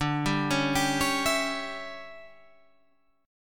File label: D Major 7th Suspended 2nd Suspended 4th, chord